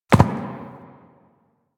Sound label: Ram Step Run Dirt; Animals; DE; Warframe; Quests; Duviri